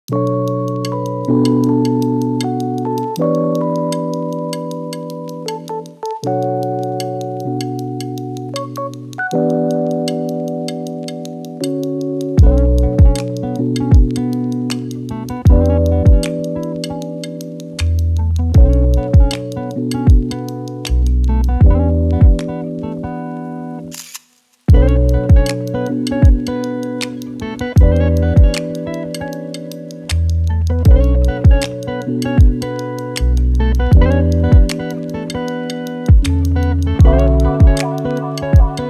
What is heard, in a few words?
lofi hip hop